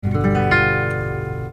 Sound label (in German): Der Septimen-Akkord G7, G7-Dur g-h-d-f'; Dominant-Septakkord; Harmonielehre